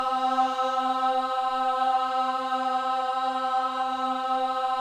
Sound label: BOYS AHS, Symphony of Voices